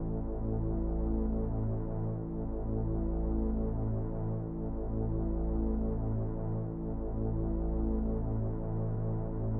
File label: BASS DRONE; Vostok Inc.